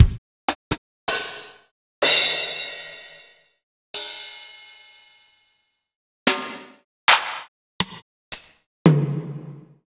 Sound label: Kawai ACR20